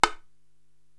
Bongo Hi Slap, Korg TR-Rack

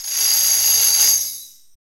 Tambrin Shake, Tamborim, Percussion; Roland BK-5

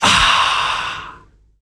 Af Aahhh, Vocals; Roland BK-5

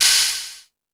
Crash, Drum Machines E-MU Pro-Cussion

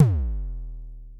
kick, Drum Machines, Clavia Nord-Drum; 300 Drum Machines

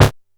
C#2, Bass; E-MU Producer Series Vol. 6 – Analogia Project; Boom da Bass

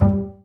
BA PIZZ G2, Orchestra; Best Service; Peter Siedlaczek's Advanced Orchestra

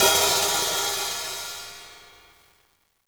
hihat - opened; JazzFunkKit

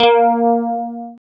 GUI, E-Guitar

